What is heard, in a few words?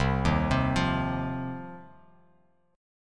C Minor Double Flat 5th C down Bass Standard; Cmbb5 arpeggiated; JGuitar